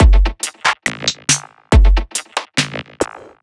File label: 140 drum loops; Skrizzle; M8; Electronisounds